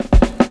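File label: snare roll, Ragga & Dub Reggae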